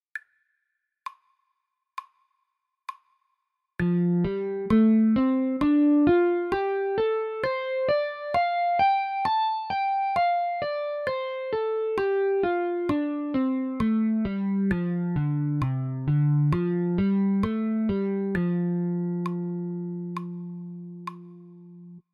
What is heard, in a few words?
3 octave a g, guitar